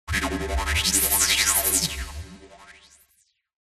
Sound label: sound design fm synth scanner delayed